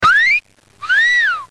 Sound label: short whistle